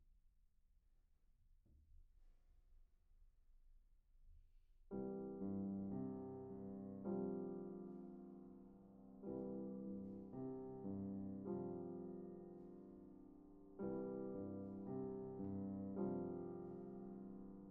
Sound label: PIANO